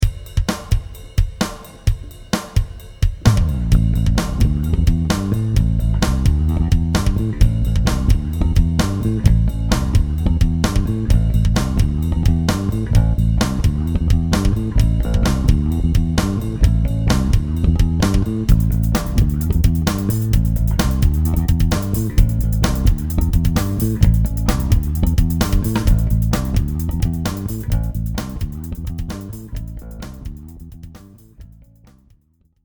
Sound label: bass test